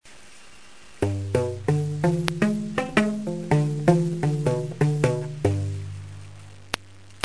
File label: guit